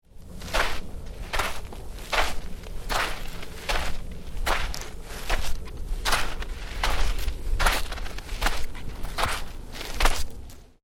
Footsteps snow light, Footsteps: sneakers walking on light snow, wind in background; Stuart Duffield